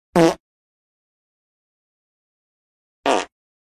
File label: Fart Ringtone